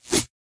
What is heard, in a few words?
Swing Sword